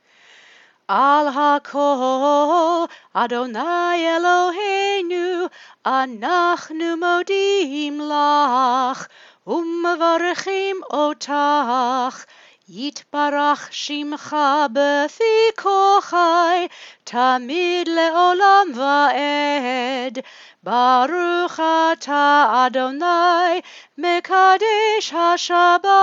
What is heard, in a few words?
Blessing After Haftarah Chant; Para 3